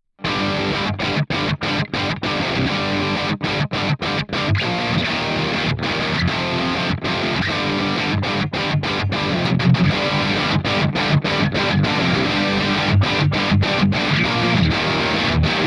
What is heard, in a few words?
Pop Rock Example Naked